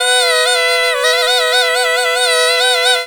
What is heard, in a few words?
PUNGI